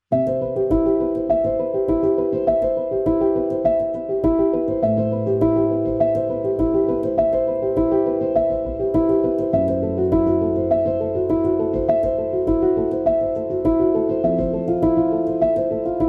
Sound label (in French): Piano cinématographique; mélodie déchirante